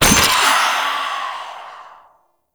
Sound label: Courier Trinket Collected; Warframe; Duviri Murmur; Courier Delivery